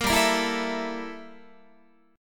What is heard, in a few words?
G Minor 6th Add 9th G down Guitar; Gm6add9; strummed